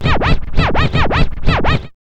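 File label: STUTTER SCRATCH; Zero-G; Funky Elements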